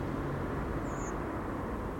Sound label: Redwing, Digital audio recorder and parabolic reflector; nocmig